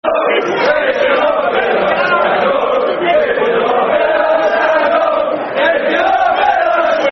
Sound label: Stand Up If You Love Villa, Football Chant (Soccer Songs); Aston Villa Avfc